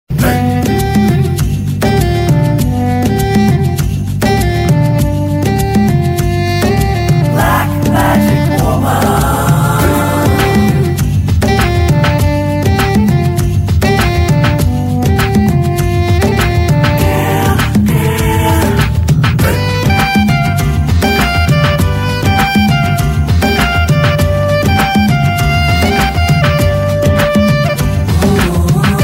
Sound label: Instrumental